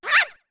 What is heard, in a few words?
Shout; Deku Link's shouts when throwing Deku Nuts